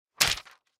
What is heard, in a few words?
Paper Stack Drop On Desk; Office; Blastwave